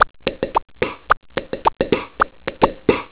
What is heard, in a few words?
Bass riff